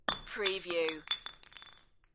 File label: Glass Bottle Drop Bounce